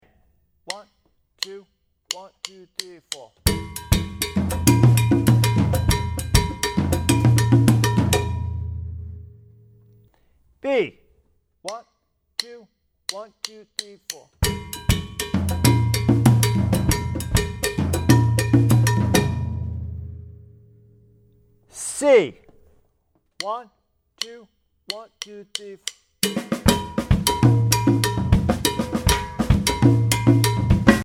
Sample Guaguanco Rhythms, jazz latin percussion music